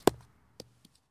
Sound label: Baseball Bounce Dirt